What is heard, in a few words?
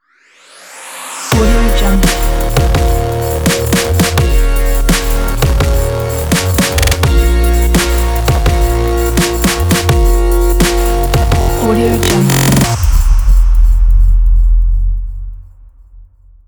modern downbeat glitch ident